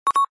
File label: lg v50s thinq 5g notification ringtone